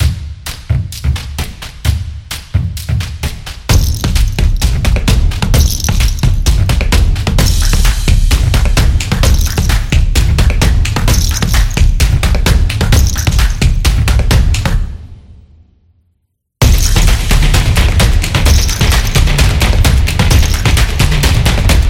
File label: Punchy